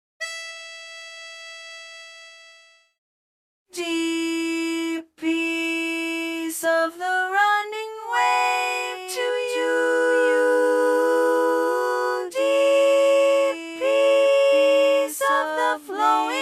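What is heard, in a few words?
Soprano 1 predominant; A Gaelic Blessing; learning tracks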